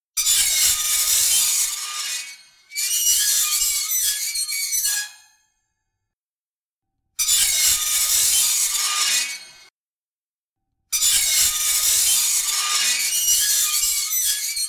Metal Screeching Sounds From Metal Pan Dragging On Oven Grill